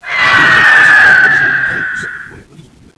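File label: hvoice wavetable; monsters infect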